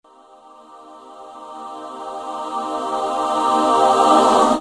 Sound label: CHOIR RISE